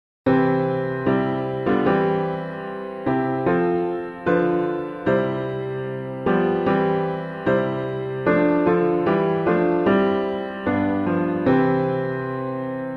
piano, hymn; Bright as the Sun Fair as the Moon; Glen

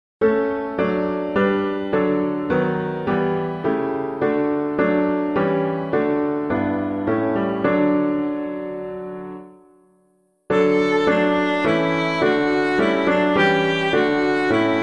The Church of Christ Cannot Be Bound, piano

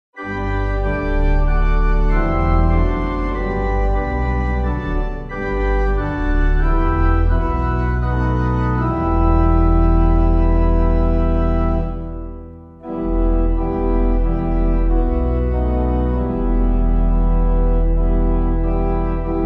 We Sing Your Praise O Christ, organ, hymn

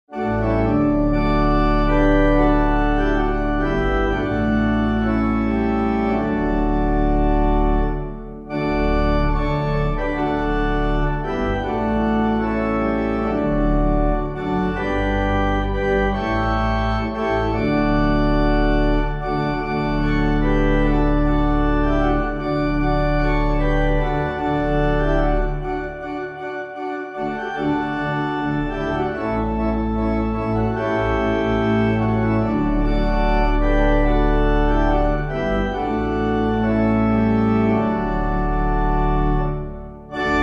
organ

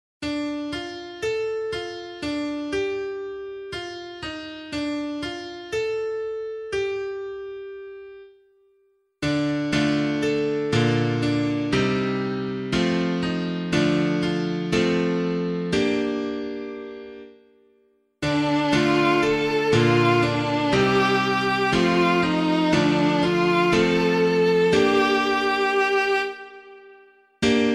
Psalm piano; LiturgyShare + St. Meinrad Archabbey

